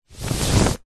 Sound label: household bedsheet movement fast single rub hand flatten